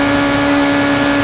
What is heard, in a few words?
Suz Hi Lp, Engines; SuperBike2001